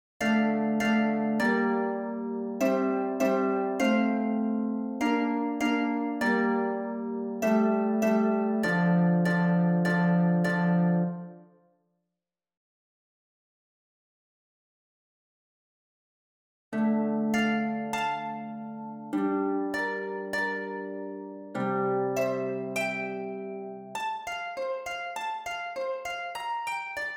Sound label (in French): HARPE